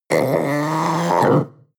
panther young snarl

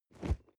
nylon leather bodydrop very soft

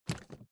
suitcase lift up hard

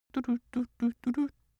cartoon male hum sequence

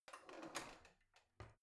old drawer creaky soft half in

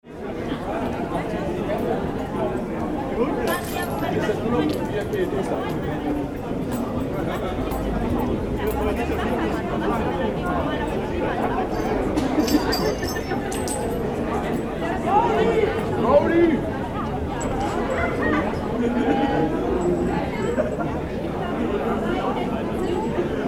people talking shouting broken glass

